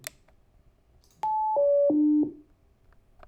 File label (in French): echantillonnage a 2 kHz